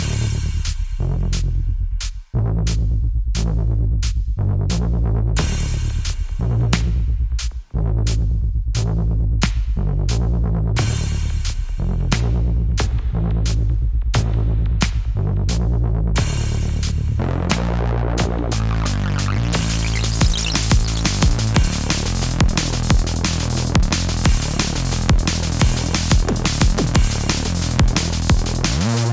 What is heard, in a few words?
DnB Drum Bass; dinopark